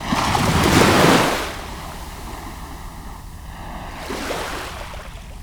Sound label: Waves; AMB; locks quest